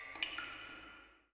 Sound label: ambient water drips; Portal 2